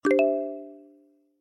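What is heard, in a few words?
Notification; Kalimat Karash; Android, iOS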